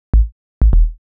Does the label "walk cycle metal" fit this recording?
No